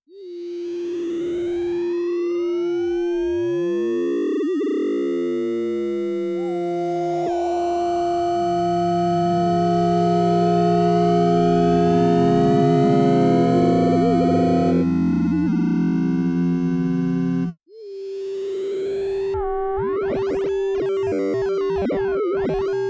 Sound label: 7OP FM; origin; ARTURIA